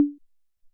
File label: Tek Clave